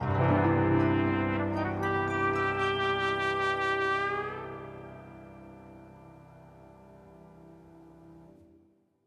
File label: Sting; lego the incredibles 2018 pc; Mx Hub New Area Reveal